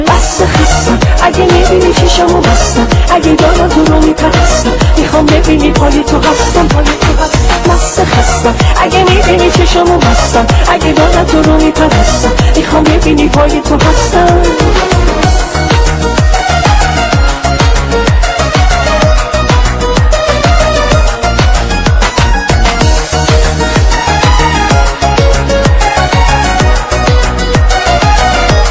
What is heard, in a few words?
Is Calling Ska